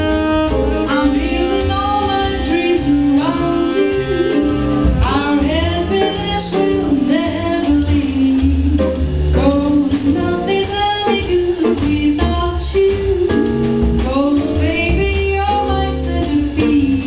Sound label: jazz